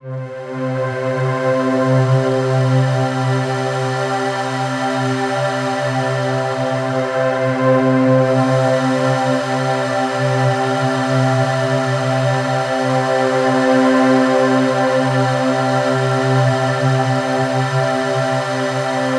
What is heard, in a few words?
Atmosphere C; Ghosthack; Free Male Vocals and Atmospheres